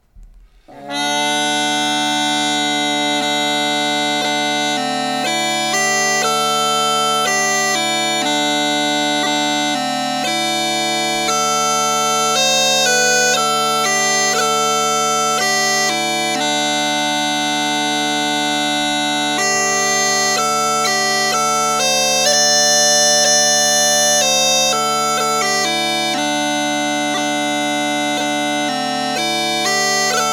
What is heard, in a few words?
WONDROUS LOVE melody only